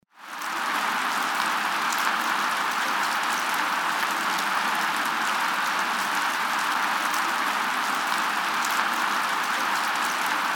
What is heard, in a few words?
Underwater Sound Snapping Shrimp